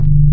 SMOOTH BASS; Pro-Rec - Synthsonic; BASSES

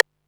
PERC, Techno 138 BPM; Best Service ProSamples vol.54; AKAI

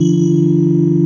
DIGITONE; Pro-Rec - Synthsonic

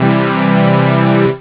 saw maj; jazz jackrabbit 2; M8

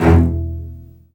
STR BS PIC E2, Vcs Odd Marc

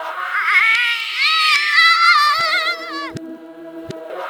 WAILER; Distored Reality

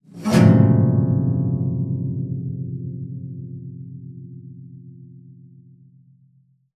SPINET; Xsample VOL-7

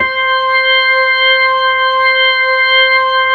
DIRTY C5, DIRTY ORGAN

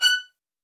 MED ATT F# 5, soft violins; Q Up Arts; Denny Jaeger Master Violin Library

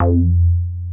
MINI, MOOG BASS

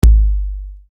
Bass Dr, EuroDance Bdrums

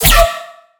bass hit strong yoink D; Virtual Riot

